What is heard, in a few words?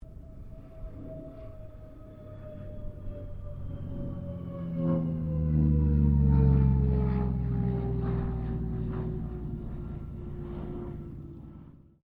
AIRCRAFT CA-13 BOOMERANG PASS STEREO; Airplanes; Vehicles